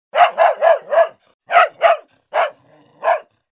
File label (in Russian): laj sobaki